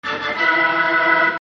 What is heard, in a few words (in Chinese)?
风琴上行; nba